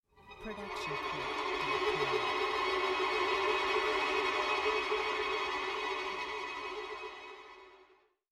cluster trem

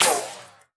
bullet ricochet

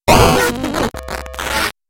game glitch

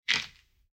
footfall gravel step; soundscrate

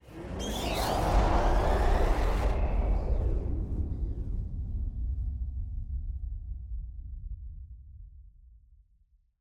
Hydraulic Lifter; soundscrate

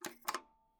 TV Button Press; SoundsCrate

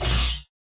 lightsword turning on; soundscrate

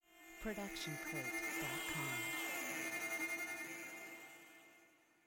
scifi glitch tone

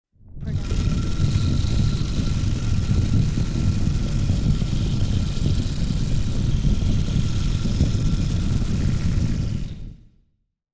dark magical pulse; soundscrate

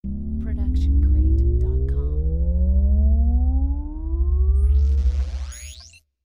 SciFi PowerUp; SoundsCrate

